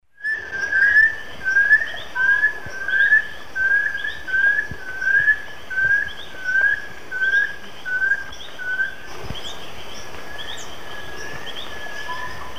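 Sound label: Amaurolimnas concolor, duet playback; S. America; Eastern Lowlands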